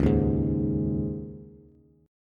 C sharp Suspended 2nd, C sharp, Bass; C#sus2; strummed; JGuitar

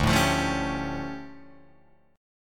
D-Minor Major 11th, D, Guitar, Drop D

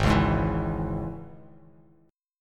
Ab Suspended 4th Sharp 5th chord, Bass Standard; strummed; JGuitar

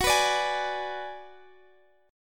Ab Minor 7th Flat 5th Gb

